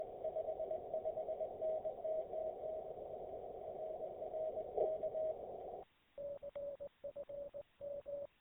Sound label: 1296MHz 834km; SM7LCB